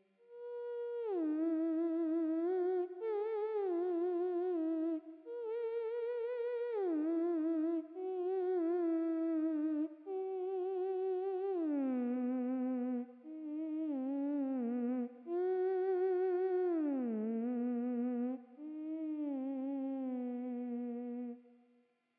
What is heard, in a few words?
Theremin; Hylics